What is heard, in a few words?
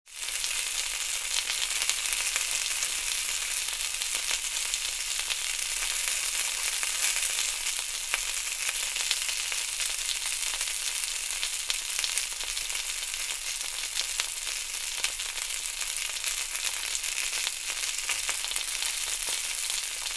sausages sizzling sound effect